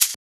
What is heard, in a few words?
Shaker; FLPRO